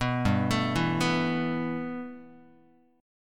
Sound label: G 6th Add 9th G, Guitar; arpeggiated; 2,3,0,2,3,x; 4A,4E,5A,5D,5Gsharp,6Csharp